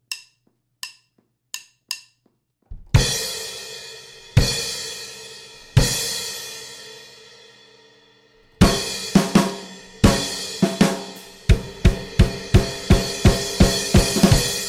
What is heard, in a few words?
drums